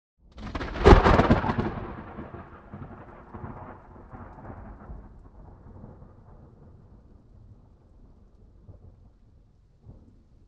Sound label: Thunder close; boom library; Thunder And Rain